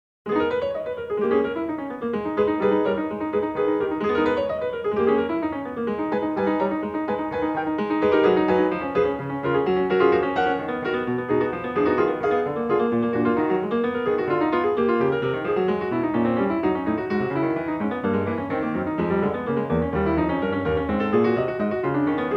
Prelude No. 18 in G-sharp minor, Bach, Well-Tempered Clavier; BWV887